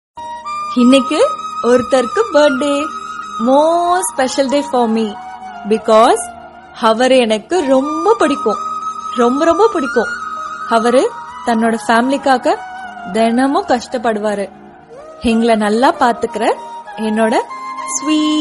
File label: Appa Birthday Tamil